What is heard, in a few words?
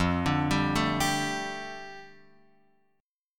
F 6th Add 9th, Guitar Open F; F6add9 arpeggiated